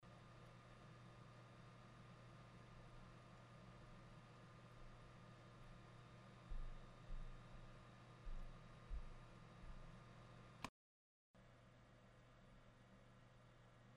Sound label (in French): bruit de fond statique; choisir micro statique dynamique